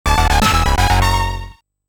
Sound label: Level Complete